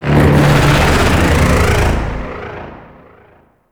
Void Dragon Vocal Ability; Warframe; Duviri

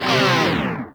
Proj Small Fire, weapons; Lizzie; Warframe

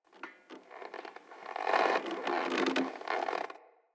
Tent Rope Creak, Interior; Soul Plane

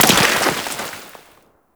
Trench Small Dirt Impact; Warframe; Tau

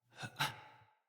Dismount, Barks; Battle; Envoy

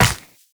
Run Ice, Footsteps; Warframe; Tenno

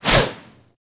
Boomerang Whoosh; Warframe; Weapons

